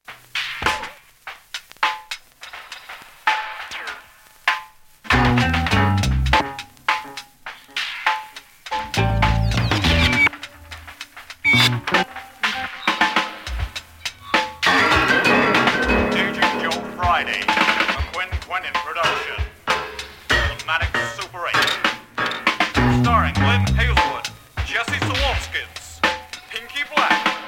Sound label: The Lowest Note On The Organ